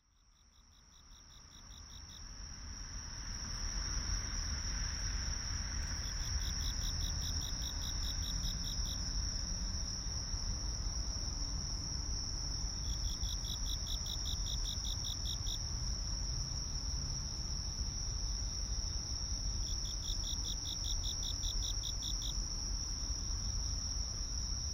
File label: crickets